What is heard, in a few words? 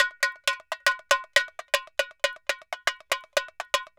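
tamborim, DRUMS SAMBA; Best Service ProSamples vol.14; AKAI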